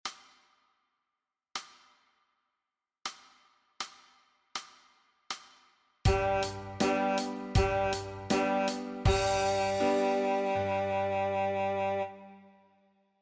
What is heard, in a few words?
sax E